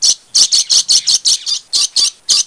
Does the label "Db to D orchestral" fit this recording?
No